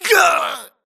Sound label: grunt death crush; Titanfall 2